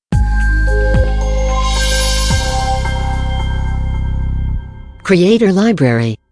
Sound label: Opening Logo; Logo-Idents